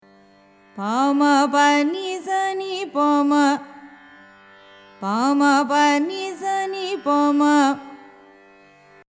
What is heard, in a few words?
mandari; carnatic music